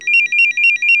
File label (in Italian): squillo